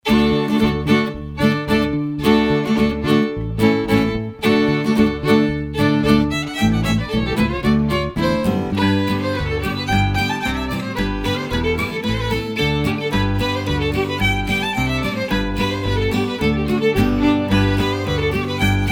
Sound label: shuffle, violin